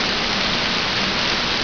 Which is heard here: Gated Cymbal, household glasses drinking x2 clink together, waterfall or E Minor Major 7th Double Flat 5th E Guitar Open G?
waterfall